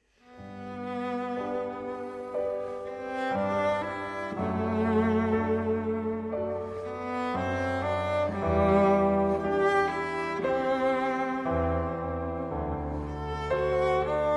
Concerto for Harpsichord, Strings, and Continuo in F Minor Largo